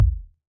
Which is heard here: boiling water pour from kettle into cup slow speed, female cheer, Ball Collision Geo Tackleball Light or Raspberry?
Ball Collision Geo Tackleball Light